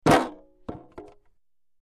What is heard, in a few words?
Wood Shield Falls Woo, shields